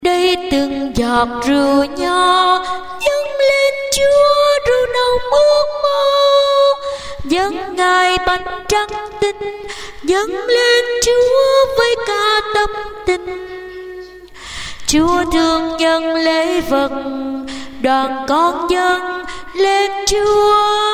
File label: sop alto